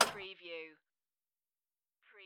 Pinball sound, clank with rattle

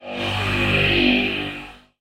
Alien Scanner; sound effects bible

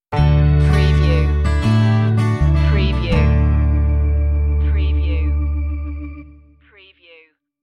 back home for good stinger

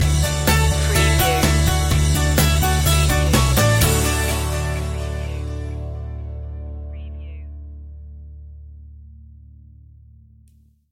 home comforts stinger

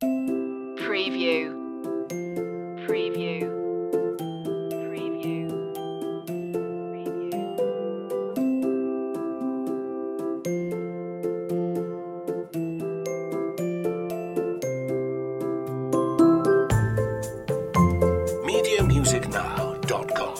baby steps no string plucks

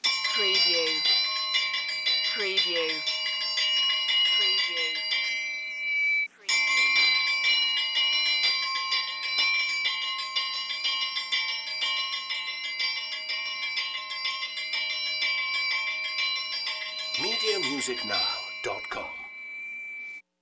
Antique Electric School Bell Slow

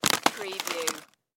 Potato Chips Bag Down

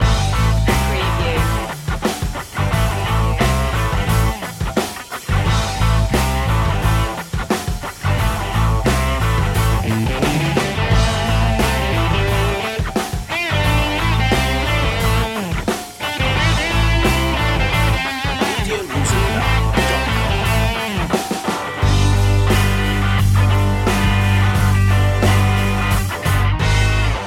total trucker loop

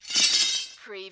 Drag Tools

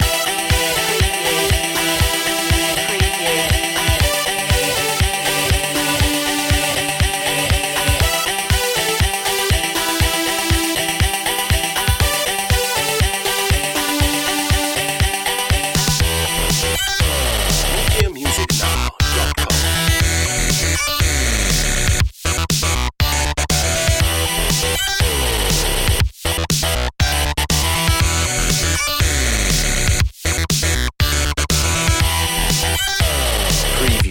dubstep gamer

